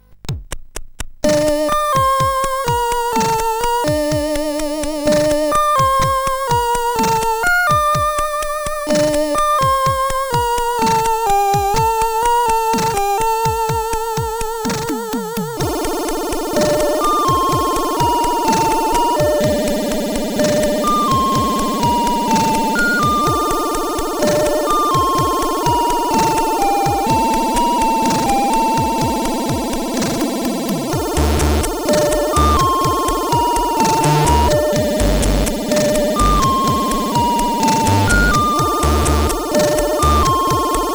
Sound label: Country, sid CSG8580R5; Fantasia Country